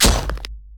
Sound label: Monster Step Backleg